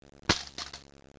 hose drop, fire; disaster

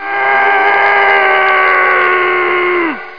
SCREAM; tv3d

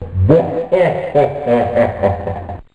Evil Laugh; Curse of Dragor

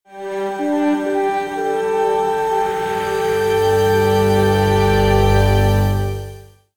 theme; Star Trek Deep Space Nine

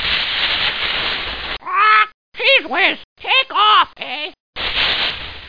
CHEEZE BIRD; T.J. Finds a Friend

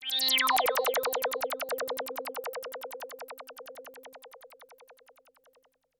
AMBNT 160; Ambient

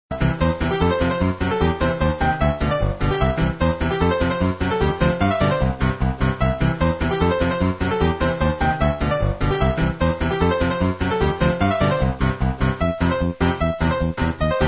theme; plumber 2

